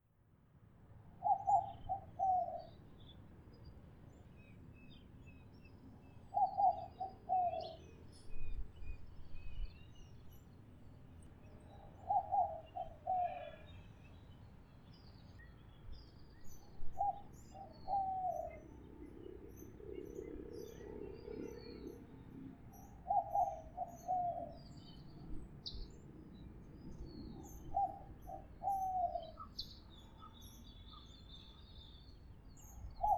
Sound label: zenaida asiatica, Aves; historia natural; galeria biodiversidad